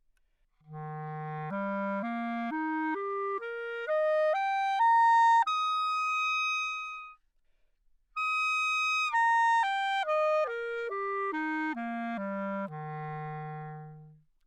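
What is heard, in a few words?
romantic clarinet bb arpeggio, Anechoic; OPENAIR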